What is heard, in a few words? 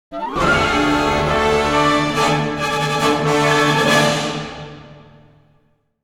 Ramp Down, music